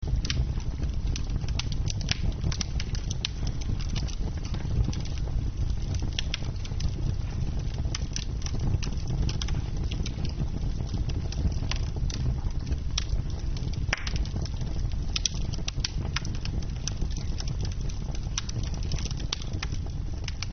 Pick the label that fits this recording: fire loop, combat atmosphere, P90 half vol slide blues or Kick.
fire loop